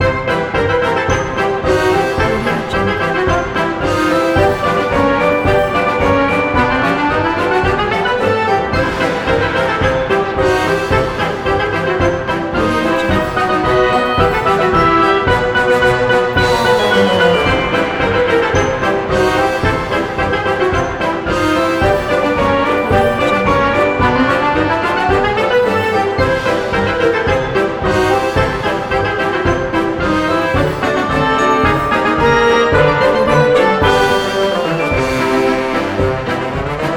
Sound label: circus; crystalnote